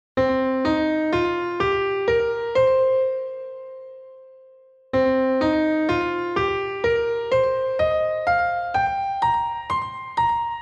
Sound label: C Minor Penta